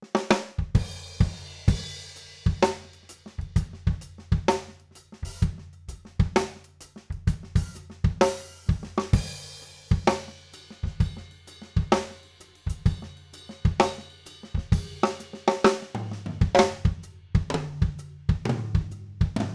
OH Overhead Blumlein, drum; drum shootout

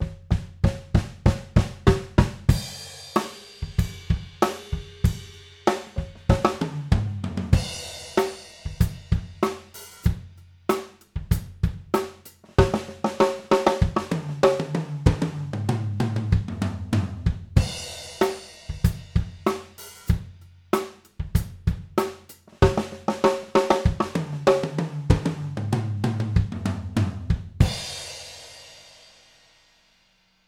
Room No Room, drum; drum shootout

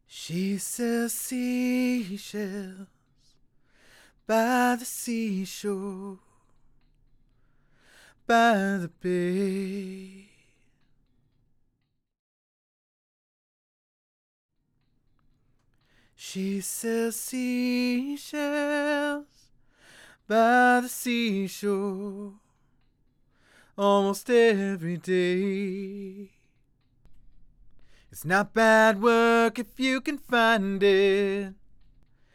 Acapella; Mic-Mod Madness

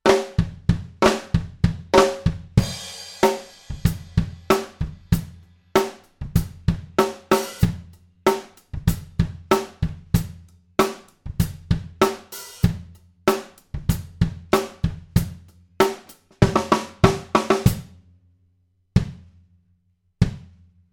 Kick, drum; drum shootout

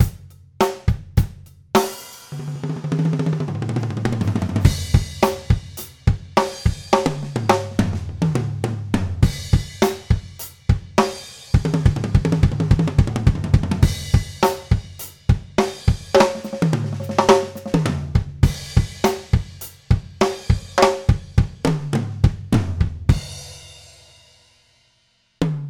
Toms, drum; drum shootout

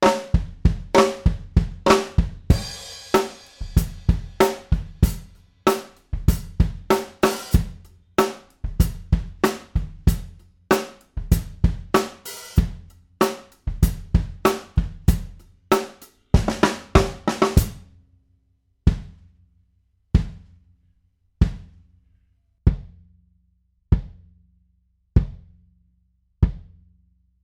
Kick fig 8, drum; drum shootout

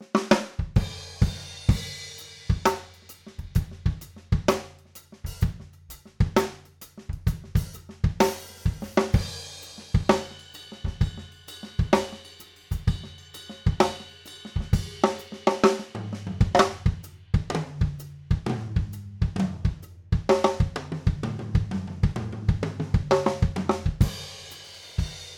OH Front Blumlein, overheads, drum; drum shootout

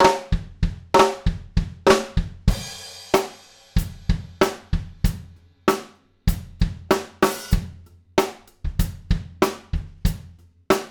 Kick, drum; drum shootout

